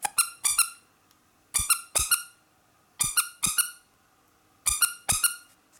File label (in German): Quietschi